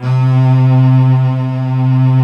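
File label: cello G; AKAI S-Series CD-ROM Sound Library VOL-2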